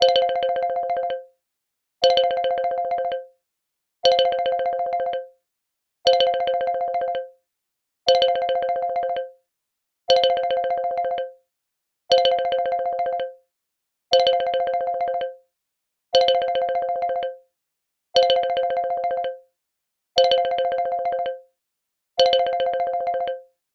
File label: Xylophone Bell, ringtones; LG X5 2018